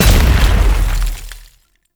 Biped Stomp; Warframe; ManInTheWall

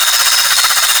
Ghoul Sawman Shot Loop; Warframe; Grineer Ghouls

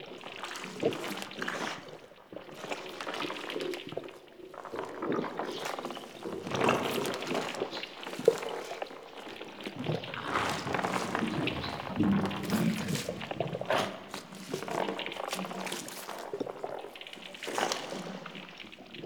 Sewer Infested Stereo Loop; Warframe; Ambience; Nineteen Ninety Nine

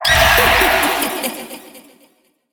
Girl Death A; Warframe; Zariman; Skitter Girl

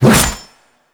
Reaper Melee Whoosh; Warframe; Wraith

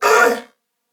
Pain, Male; Corpus Venus; Warframe; CorpusVenusMaleA

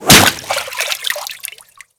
Whip Impact Water, Weapons

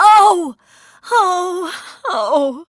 Death, Barks; Kuva Lich; Persona B

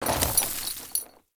Horse Brakes Trot; Vehicles; Foley Actions; Warframe; Duviri

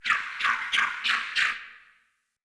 Cardinal Decoy Call; Objects; Thawtide

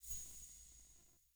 UI Context Action; Eternium Garden

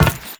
Step Metal, Footsteps; Enemies; Warframe; Teshin

